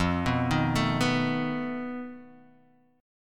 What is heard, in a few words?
F 7th Flat 5th F down Guitar Drop D